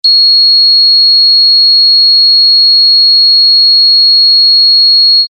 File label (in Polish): Ton